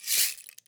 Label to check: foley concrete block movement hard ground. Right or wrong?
Wrong